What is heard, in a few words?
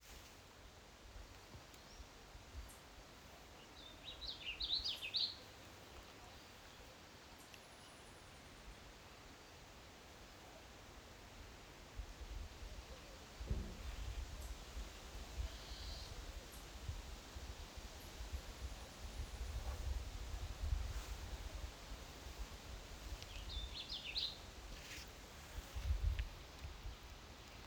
warbling vireo